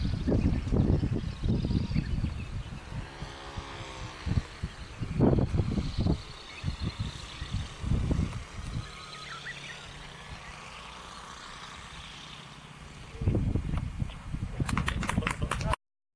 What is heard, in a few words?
landing; himawari2